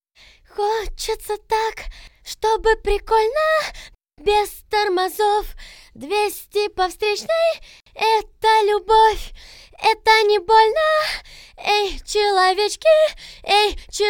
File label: VOX Couplet, acapellas